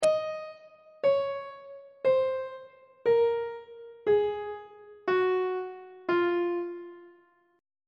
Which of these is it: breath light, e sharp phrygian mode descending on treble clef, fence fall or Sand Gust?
e sharp phrygian mode descending on treble clef